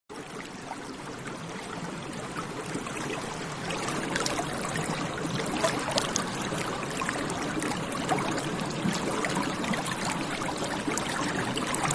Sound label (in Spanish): CURITO SONIDO; el curito un pez con suerte